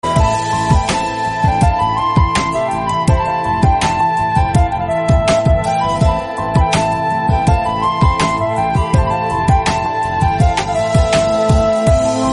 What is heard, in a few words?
a lovely tone